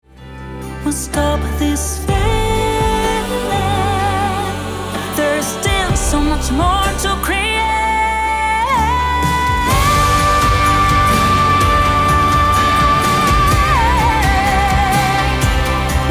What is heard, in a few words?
hover songs